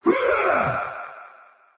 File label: attack big; dota; faceless void